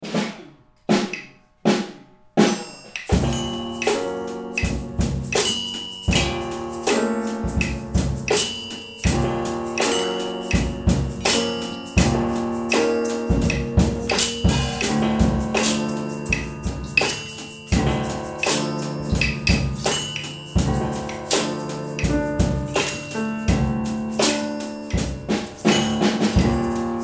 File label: Sound Workshop Piano